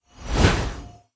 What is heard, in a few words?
Portal; blue archive